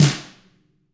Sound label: tama flam; ILIO; Double Platinum Drums 1